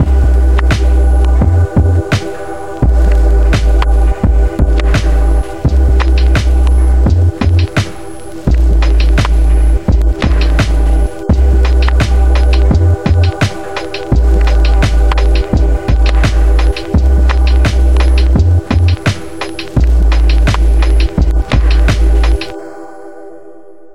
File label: very short beat